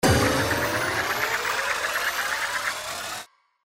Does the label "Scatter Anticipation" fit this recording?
Yes